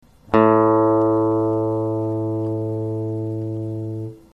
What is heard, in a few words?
Tuning A, A string (the fifth string); guitar